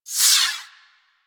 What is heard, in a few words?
Fighter Fire Whiz By; Warframe; DE; Vehicles; RailJack; RailJackEnemyShips; Grineer; Space Fighter Rager Agent